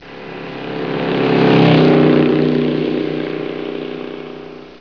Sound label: motorcycle